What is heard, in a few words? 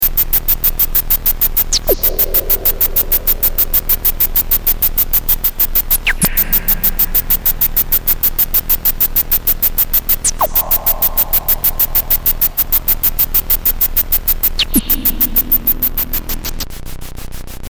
Dreadbox NYX V2